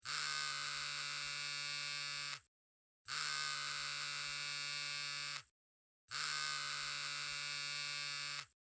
Technology Pager Vibrate Three Times; Telephone Sound Effects; Telephones (Sound Effects:Electronics)

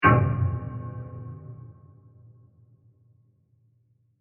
Scary Diffuse Impact; Burst Sound Effects; Bursts (Sound Effects:Impacts)

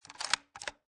Rifle Bolt Action Chamber Closed; Gun Handling Sound Effects

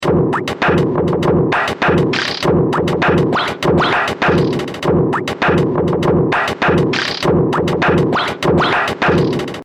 100 Bpm Dance Beats Loops; Dance Music; Percussive And Rhythmic; Seamless loop

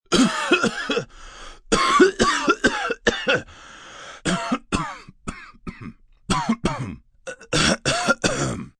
Cough Chest, Cough, male, sick, chest cold, long; human voice; VoiceBox

